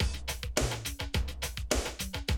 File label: Classic DrumLoops